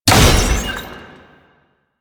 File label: Scan Impact; DE; Warframe; Frumentarius